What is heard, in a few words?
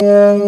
B3 Lo Dist Slow F#2; mt-32